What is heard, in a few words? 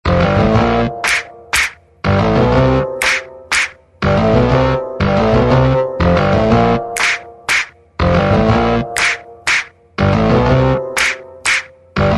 Fun Tones Ringtone